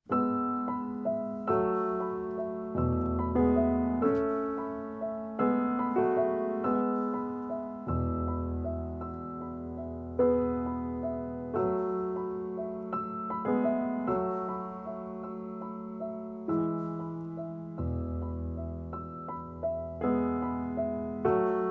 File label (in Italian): piano